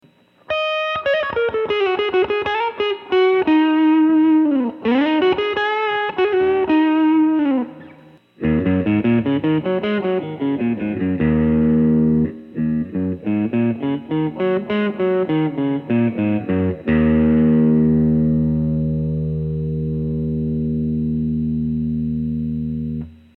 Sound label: Strat ECC83